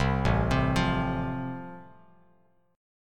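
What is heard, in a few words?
A Minor Sharp 5th, Bass Standard, chord strum; Am#5 arpeggiated; JGuitar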